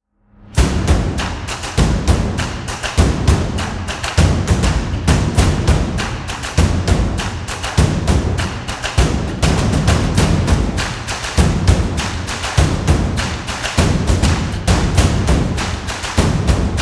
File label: Cinematic Stomp